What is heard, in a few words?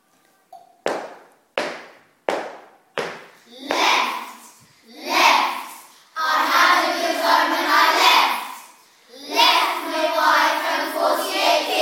squarebashing